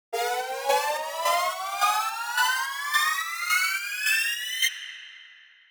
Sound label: stutter